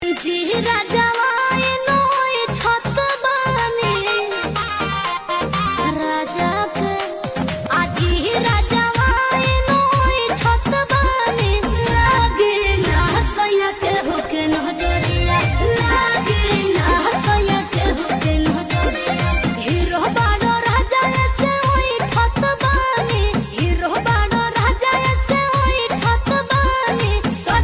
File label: Female version